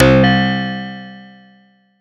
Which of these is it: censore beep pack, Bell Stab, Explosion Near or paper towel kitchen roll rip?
Bell Stab